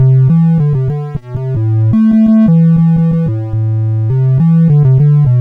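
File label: Pirati, MOS 8580 SID chip music, games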